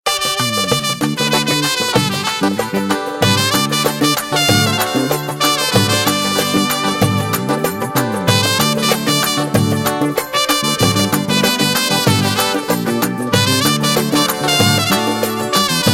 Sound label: algerian trumpet; ringtones